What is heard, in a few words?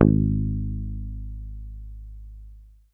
HOFNER, Bass; Sampleheads; Will Lee Bass Library; HOFNER SUS